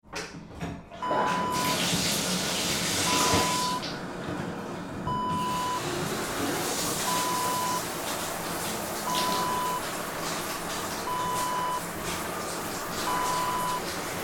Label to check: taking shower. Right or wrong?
Right